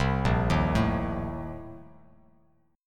A sharp Major 7th Suspended 2nd Suspended 4th, Bass Standard; A#M7sus2sus4; arpeggiated; JGuitar